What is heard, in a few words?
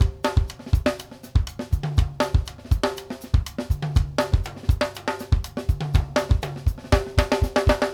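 basic, latin, drums; Sampleheads; New York City Drumworks VOL-1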